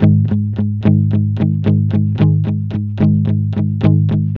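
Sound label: ROCK G sharp 110, Vintage Blues Guitar; USB Soundscan vol.22; AKAI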